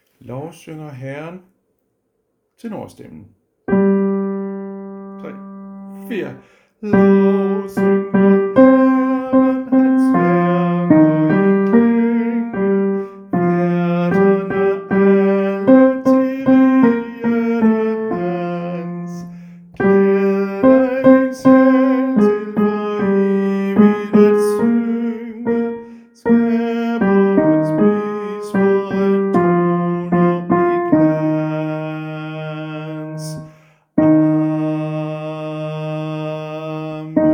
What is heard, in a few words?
tenor; harlev koret; Lovsynger Herren